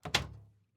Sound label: Door, Cupboard, Large, Open; UberDuo; The Kitchen Audio Play Set